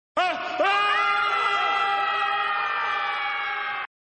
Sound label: Falling cries; Indy and Shorty